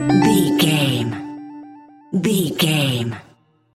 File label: jingle chime positive